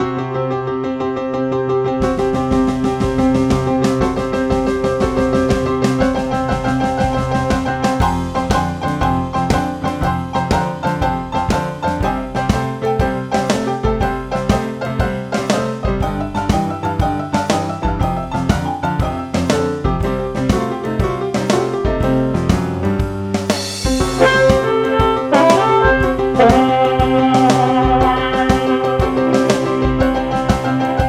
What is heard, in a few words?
Blues